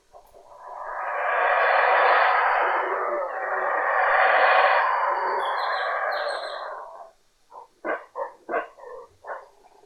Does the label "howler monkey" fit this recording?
Yes